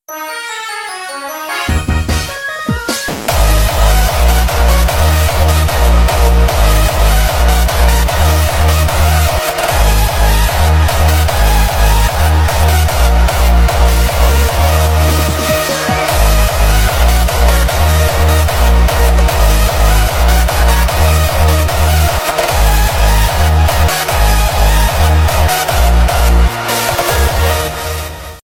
hardstyle remix; мемы

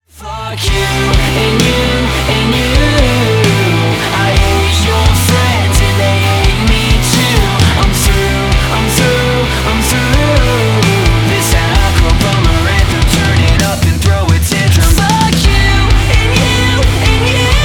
rock cover

